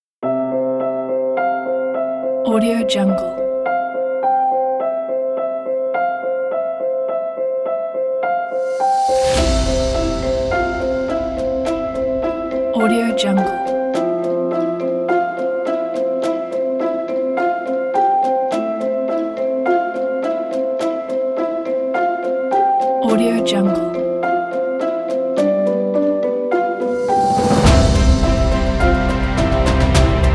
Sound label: inspire